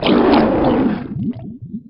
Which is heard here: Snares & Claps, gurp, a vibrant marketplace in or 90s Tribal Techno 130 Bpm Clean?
gurp